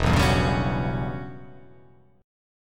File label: E Minor 6th Add 9th chord